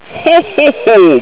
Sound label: hohoho